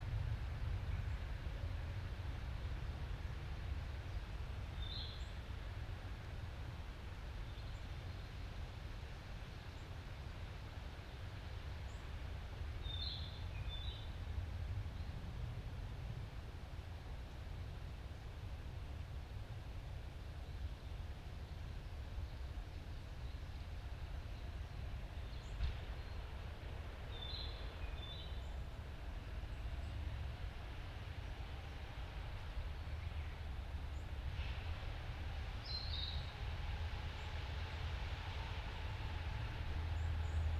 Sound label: yamanakako sound